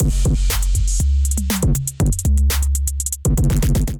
Durty Kit, Drums; Ableton Factory Packs; Beat Tools

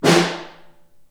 SN Far, Snare; Ableton Foundation FreePacks; Snare Designer

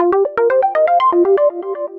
Arp, Synth Rhythmic; Ableton; Factory Packs; Synth Essentials

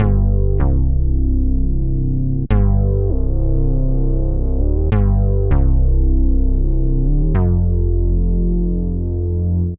Mini Bass C Minor 80bpm; MIDI Clips; Bassline; Super Boss; Ableton; Factory Packs; Chop and Swing

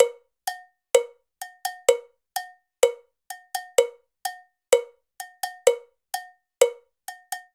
Cha-cha-cha Cowbell 127bpm, Latin Percussion; Ableton; Factory Packs

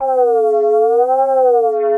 Kyoto Drone, Effects; Ableton; Creative Extensions; Poli

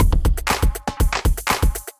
Kit, Drums; Kindified Kit 13; Kindified Kits

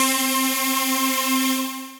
Icing key, Synth Keys

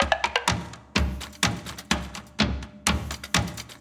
Foley & Real Drum Kit - 126 bpm; MIDI Clips; Drums; Ableton; Mood Reel

